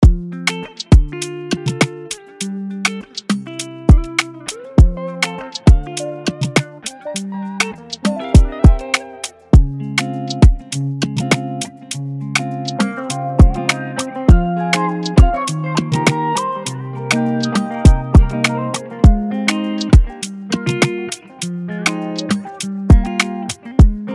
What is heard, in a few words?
Afrobeats Guitars n Rhythms; SALEWA